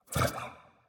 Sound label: zombie vox; smite; ahpuch skin10